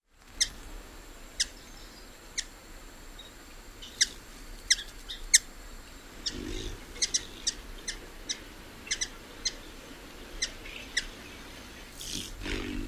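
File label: female chips, Lucifer hummingbird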